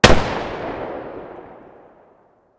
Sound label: svd muzzle mono far